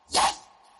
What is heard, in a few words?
bonus symbol move; Hungry Shark; Wazdan